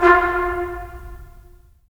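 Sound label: brass trumpets staccato, left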